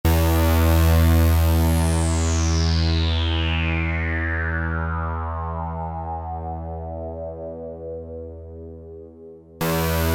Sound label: RUSH REZZ, Oberheim OB-8; Factory Patches